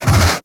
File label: Kubrow Tame Idle Alert Snort B; Warframe